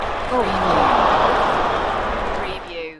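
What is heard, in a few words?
Arena Crowd Uh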